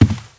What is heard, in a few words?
foot carpet, footsteps